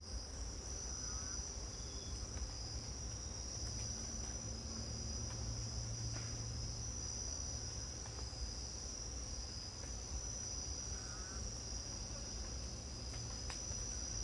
jungle costa rica morning insect bed distant bird calls spaced; ab cmc6; fvtt sonniss gdc audio